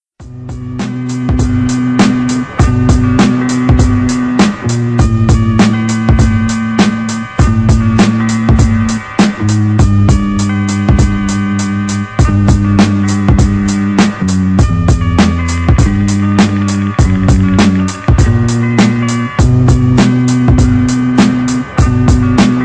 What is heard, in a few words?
sadistic instrumental